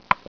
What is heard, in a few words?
click